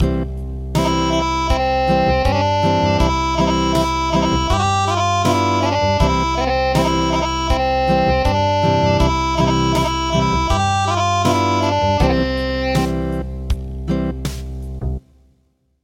Acoustic

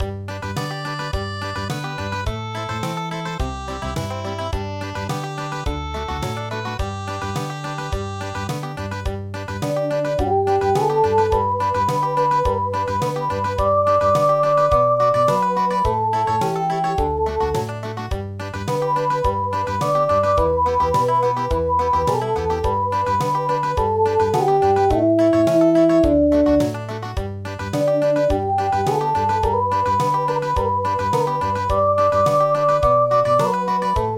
Tea picking Country; chatsumi

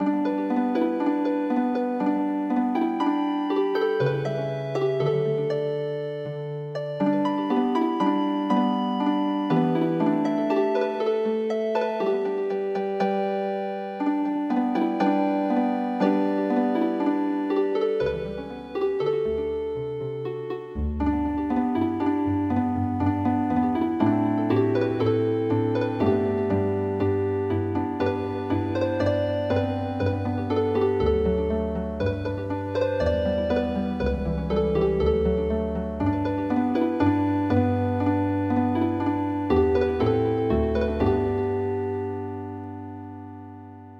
Healing Harp

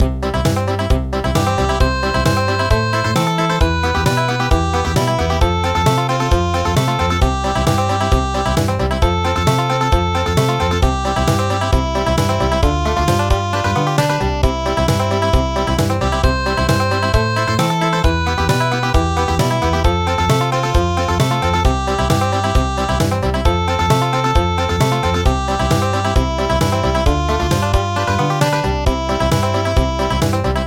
Country; Ayelevi

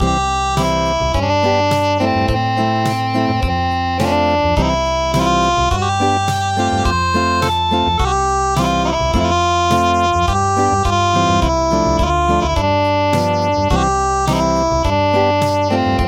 Acoustic

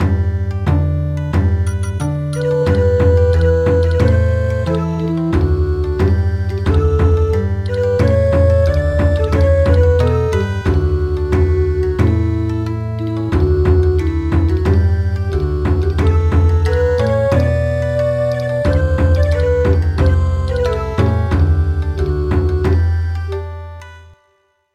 Bon Odori

